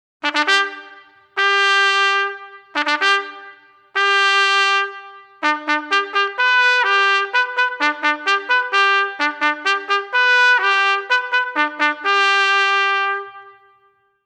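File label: School Call, bugle call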